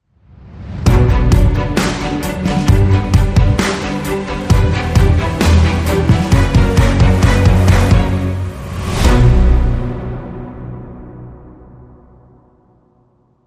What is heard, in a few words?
Hybrid Sting, Short